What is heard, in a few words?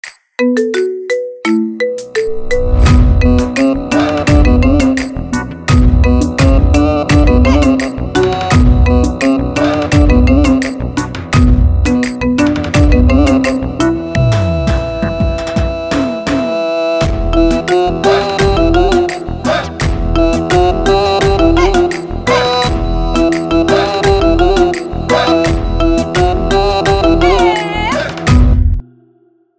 Arabic Shooti